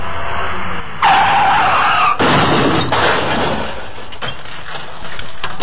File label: Car Screech And Crash